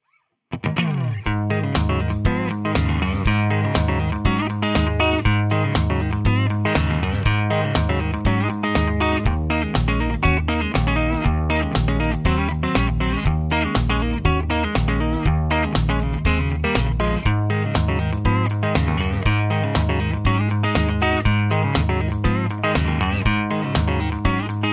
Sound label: clean neck, Tele; schwarzbach; Tele60s